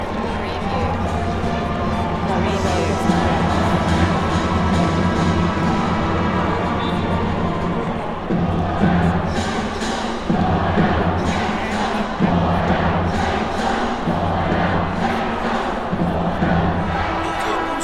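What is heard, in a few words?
Arena Crowd Noise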